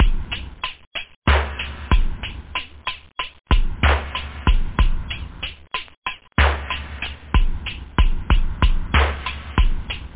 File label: etronic, loop house